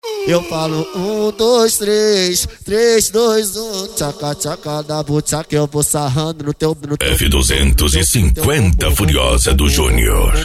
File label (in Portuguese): funk e sertanejo